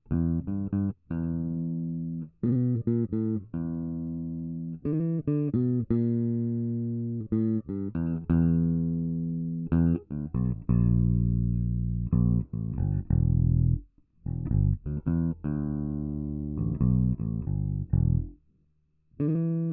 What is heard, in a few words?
6 str Bass